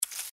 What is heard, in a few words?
cazadore foot; npc